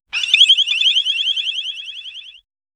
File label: alligator cartoon sound